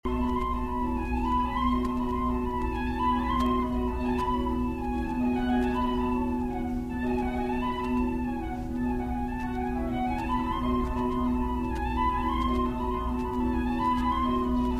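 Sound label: Flute & Harp; Jazz; Entr'acte, Jacques Ibert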